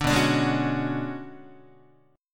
B Minor 6th Add 9th B down Guitar 4A 4E 5A 5D 5G sharp 6C sharp; Bm6add9 strummed; JGuitar